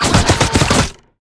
crocs hero throws spikes

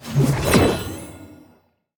spiders bossfight buff charge

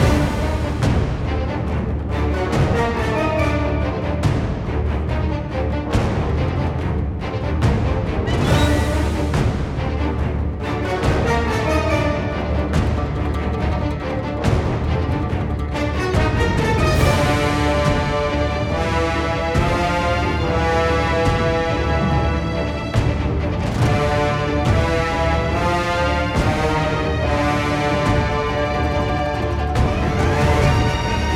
bg music boss

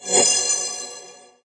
teleport sin arpa; faustus

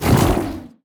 spiders enemy spider sister range

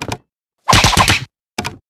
tetsubo storm

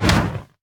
basic Attack cast; heroes kratoa

